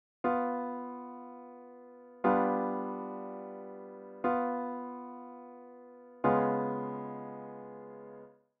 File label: pivot Tritone